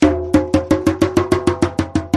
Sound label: tablas, notifications; Sony; Xperia Z3